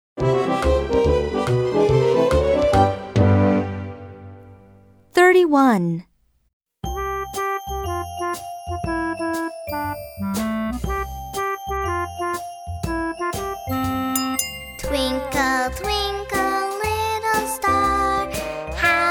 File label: Twinkle Twinkle Little Star